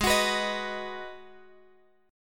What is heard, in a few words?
G sharp Minor 7th G sharp, Guitar Drop D; 18,21,21,20,x,x